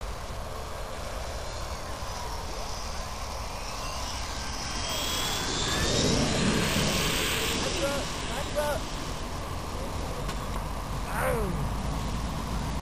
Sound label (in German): Flug Landung